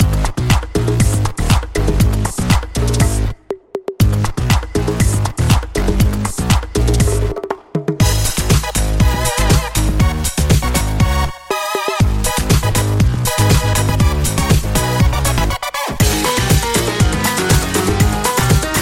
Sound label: Konga Conga